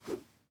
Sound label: throw